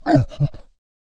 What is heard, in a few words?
Mob die; Monster; 원숭이 부족; 금엽후추장